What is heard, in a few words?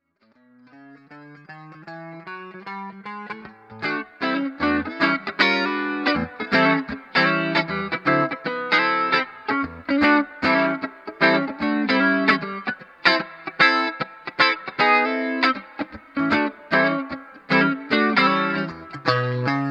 chorus